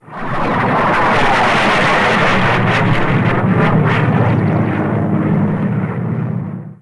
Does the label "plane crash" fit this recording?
Yes